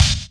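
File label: Delay kick, Boss DR660; drum machine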